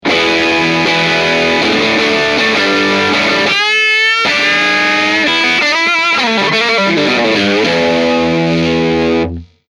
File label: Full Gain; MOLLER